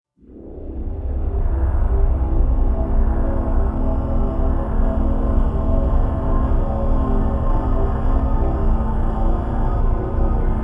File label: Dungeoneering Floor Track